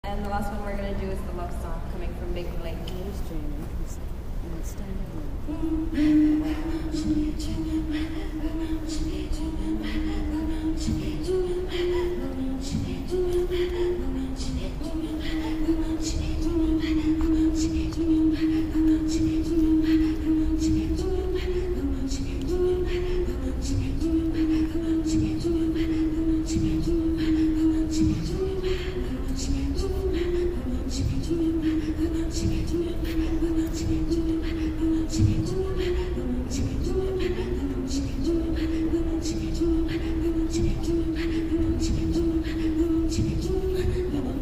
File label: Inuit Throat Singing; Ottawa